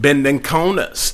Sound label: Rap Vox